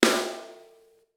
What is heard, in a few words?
snare dry wood